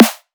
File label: Low Tok Snare